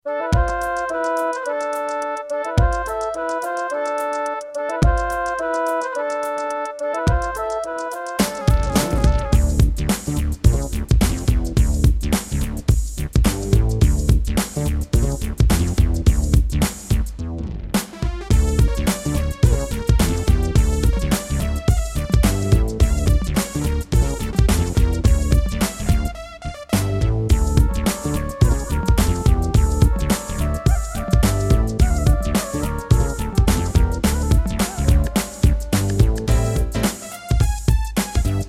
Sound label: Yamaha MU2000EX GSMODE